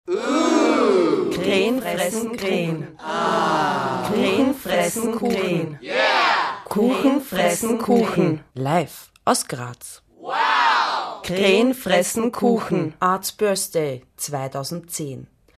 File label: JINGLE